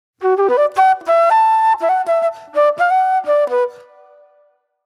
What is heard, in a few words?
Mellow Soul Flute